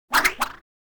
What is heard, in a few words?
swish multiple; crashes swishes impacts; Asian Show Sound Effects